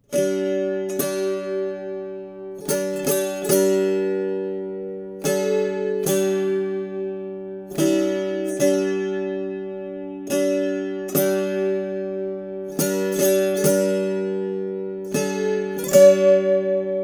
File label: Amazing Grace on Dulcimer